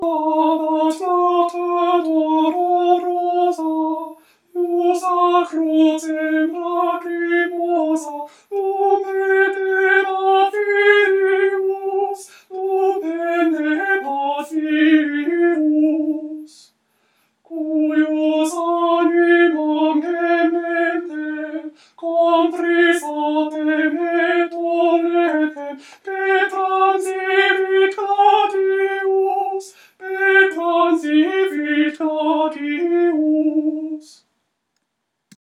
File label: Stabat Mater Alto